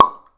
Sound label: Cork